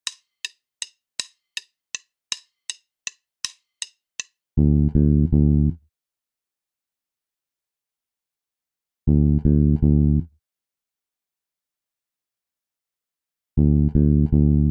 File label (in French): decalage triolets exercice basse tempo 080; exercices